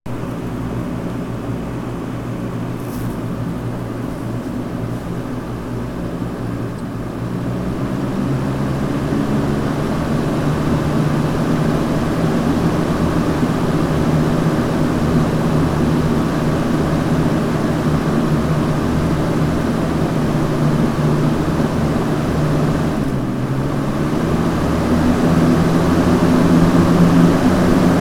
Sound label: Fan